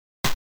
bubble burst

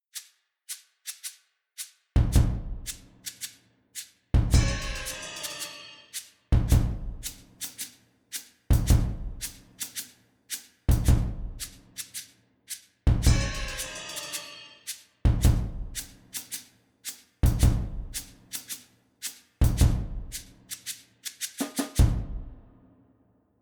Drum Practice

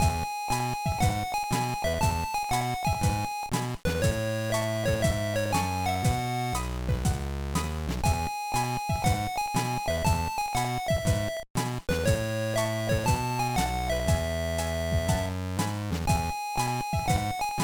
BGM; konjiki no gashbell the card battle 2005 gba

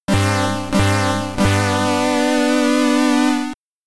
Game Over (SID Stereo); rambo iii commodore 64

